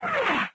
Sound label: travel minimize; windows 9x; sound schemes